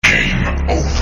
Game Over

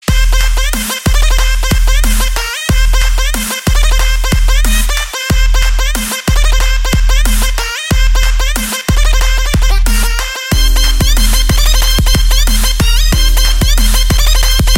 Trap

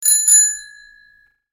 Bicycle Bell Notify

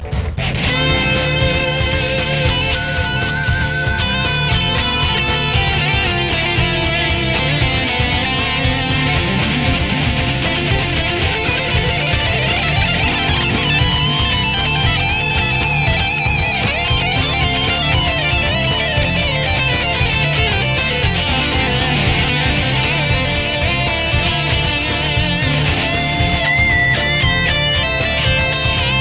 Heavy Metal Solo

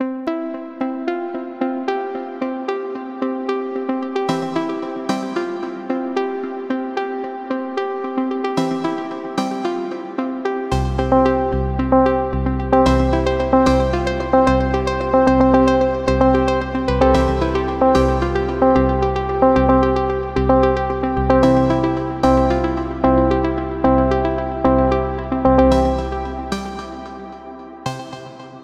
Summer Synths

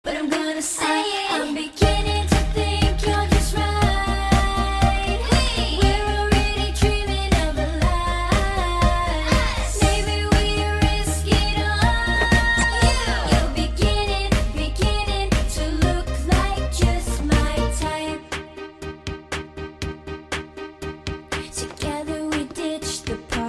Chorus

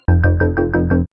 Countdown; Vice City

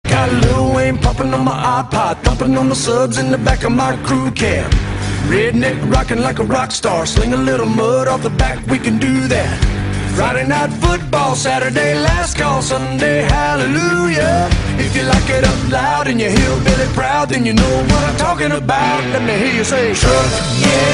Music

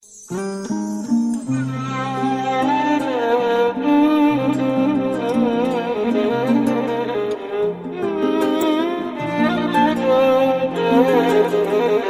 Classical music

